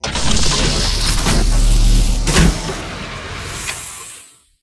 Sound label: start Screen Continue Up; wildotron 3000